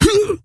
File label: Stonebark Wound; Elder Stonebark